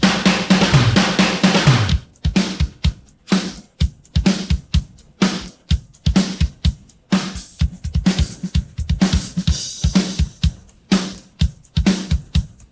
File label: pattern, drums